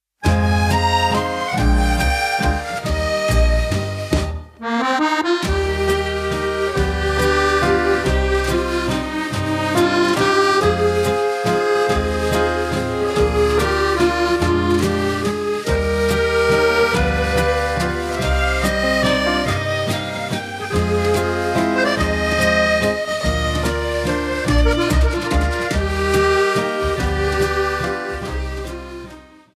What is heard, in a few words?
Scottish Waltz; dance through the decades